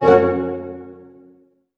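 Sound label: harp hit C major; Sound On Sound - Peter Siedleczek's Orchestra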